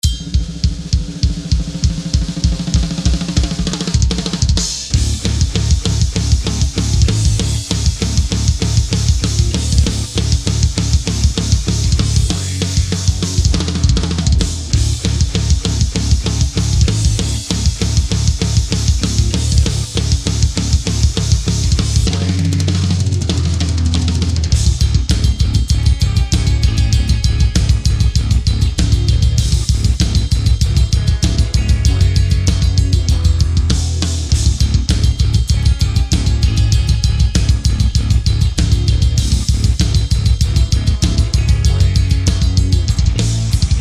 total metal drums loops; ray luzier

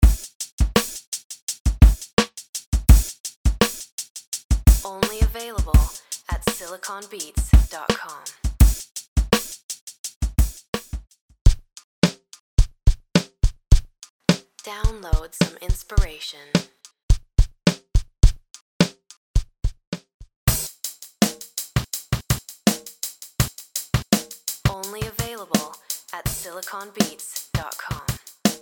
kits

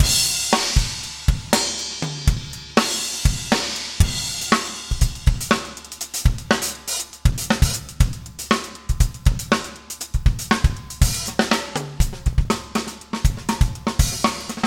nu skool funk

